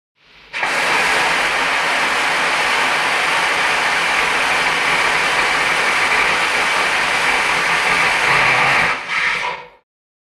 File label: Steam Release Train